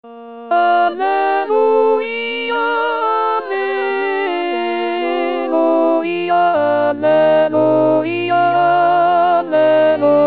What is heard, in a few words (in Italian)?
alleluia contralto; gabrieli